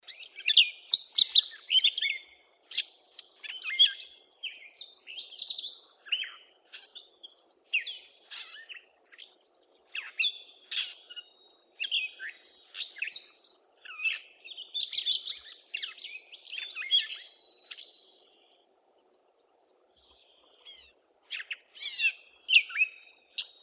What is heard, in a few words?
Catbird Singing New Y